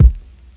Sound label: Kick